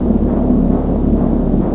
fan lite

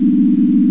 DROP

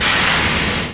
steam

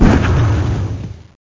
ROCKET; Graliens

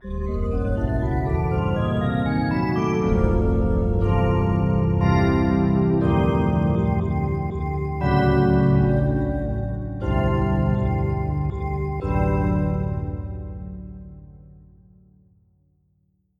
Digi-Oct Pad, synth

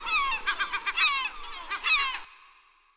Icy Bird; ImpGal2; colony

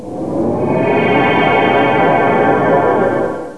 Transporter; Star Trek; Rescue! 1.6

